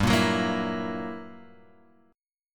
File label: G Minor Major 13th, G, Guitar, Open G